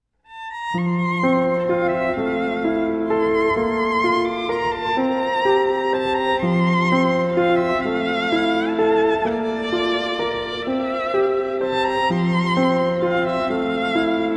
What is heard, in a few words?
Waltz